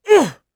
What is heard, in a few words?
get hit voice; UFC Tapout; XBOX